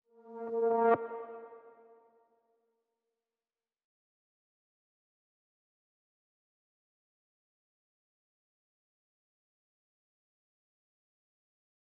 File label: 125 BPM A# Min Riser; Melody Loops; Loop Stems; Cymatics; Antidote